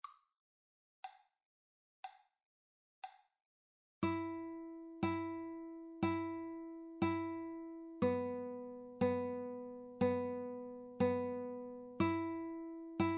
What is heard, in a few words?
open Strings